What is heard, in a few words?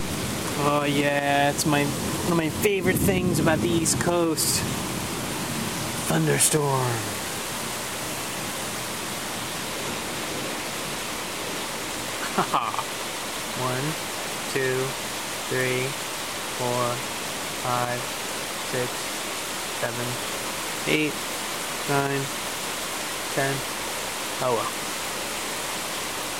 thunderstorm rolls over nyc; i never get tired of these